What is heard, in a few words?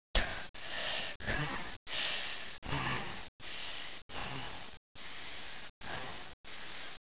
Female breathe fast